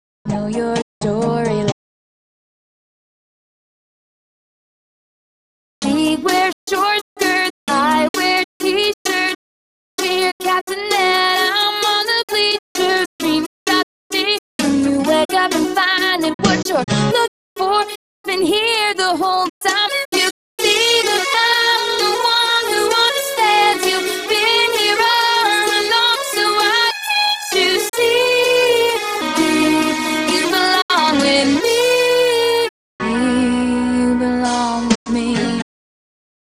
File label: melody